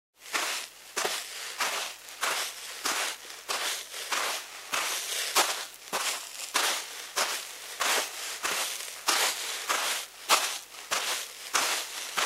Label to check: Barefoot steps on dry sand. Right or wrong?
Right